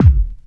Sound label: kick drum one shot b key, Royality free kick tuned to the B note. Loudest frequency: 123Hz; kick drum samples